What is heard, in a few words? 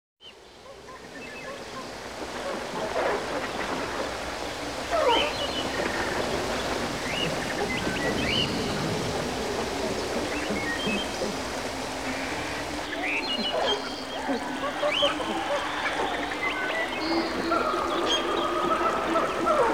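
African forest, nature sounds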